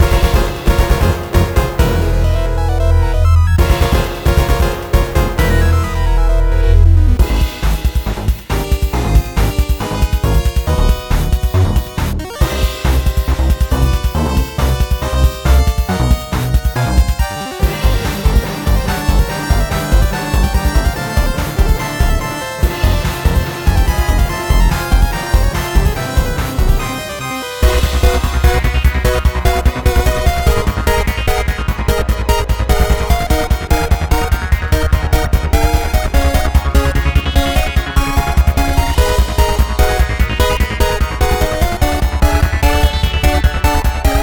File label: Battle; SanctuaryRPG